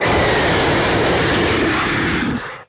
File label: roar; seregios; dota; mh event